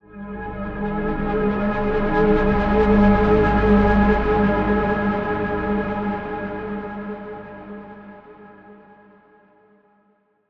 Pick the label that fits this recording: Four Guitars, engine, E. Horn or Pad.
Pad